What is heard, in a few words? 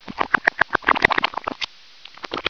GURGLE; homm2